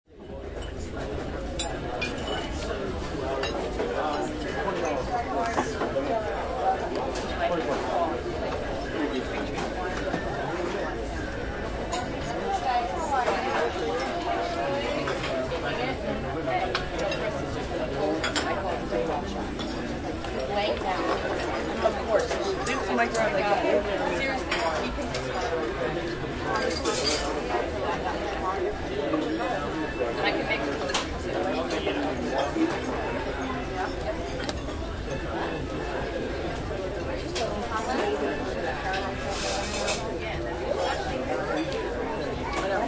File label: Crowd American Diner Interior, Busy cafe with many people; eating, talking, food being served. Very faint music in bac